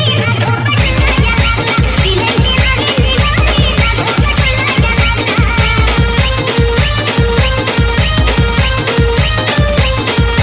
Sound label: marathi music ringtone